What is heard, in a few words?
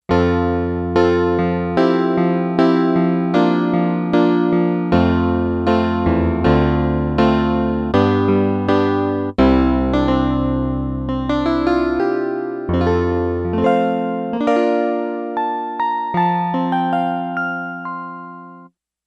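Grand Piano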